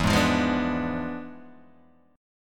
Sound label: E 7th Flat 9th Guitar Open G, chord